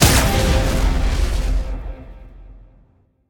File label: fire; smite; baronsamedi skin7